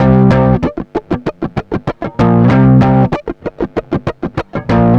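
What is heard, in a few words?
distorted guitar; USB Soundscan vol.31; Miro s Grooves; AKAI; DISTO GTR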